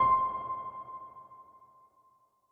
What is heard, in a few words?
menu cursor